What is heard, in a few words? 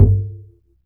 E string upright bass; Upright Acoustic Bass